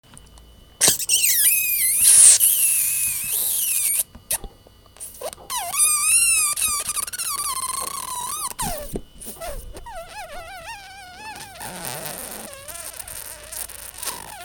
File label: tiger thrush